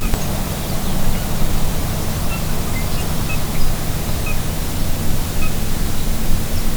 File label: Sora keep; National Marsh Bird